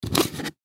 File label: Gift Box Full Pick Up Cement; Pick Ups; Foley; Blastwave